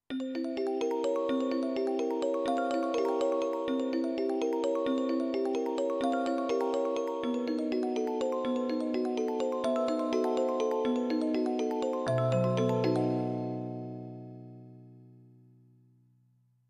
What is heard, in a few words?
Orgel